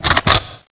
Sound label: oicw draw, weapons